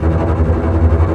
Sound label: trem bass E2; E-MU Formula 4000 Series Vol. 5; Protozoa; Proteus2 Presets